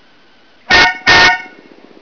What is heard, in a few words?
airhorn; garage